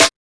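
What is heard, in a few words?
snare drum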